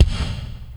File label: LOFI; ROKON; Spectrasonics; Burning Grooves